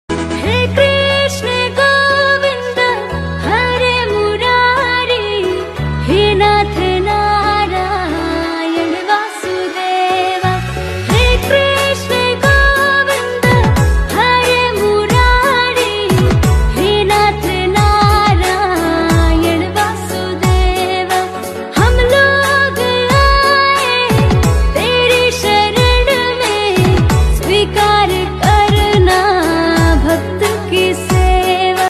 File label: hey krishna govind hare murari ringtone new song ringtone